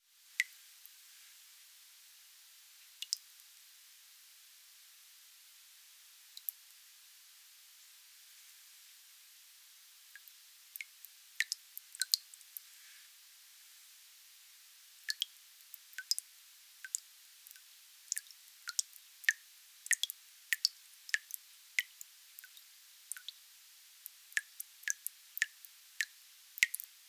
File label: Water Drip Dripping Kitchen Sink